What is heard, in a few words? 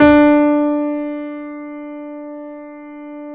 D 3, Keyboards Acoustic, GRANDP ST; USB Soundscan vol.17